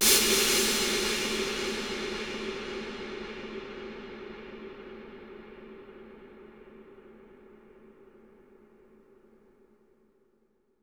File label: snare, big rooms